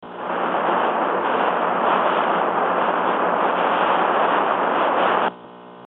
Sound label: galactic background; ufro